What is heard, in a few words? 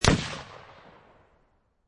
Rifle Thompson Center Encore 7Mm Single Shot Exterior At 45'